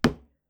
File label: wood; Phasmophobia